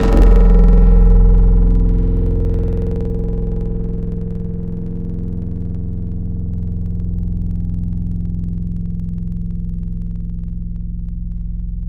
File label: Chroma, Tropical Mallets